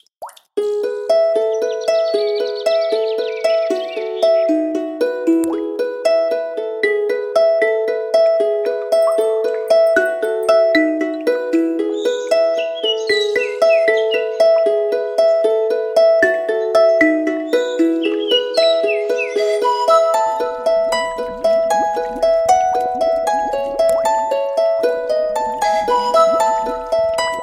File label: Morning Alarm